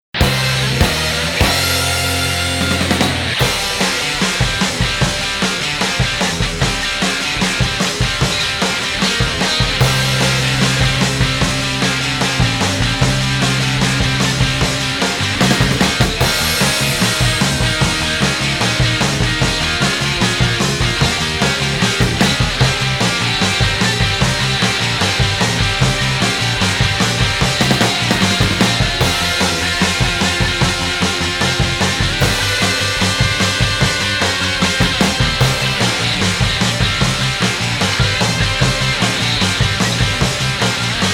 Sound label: rock guitar